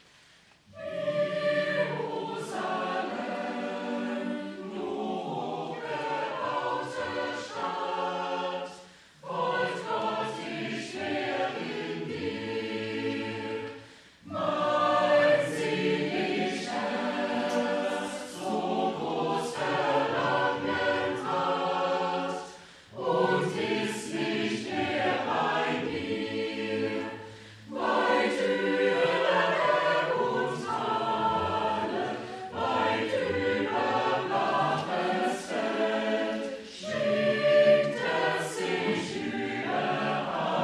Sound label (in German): Chor; Jerusalem du hochgebaute Stadt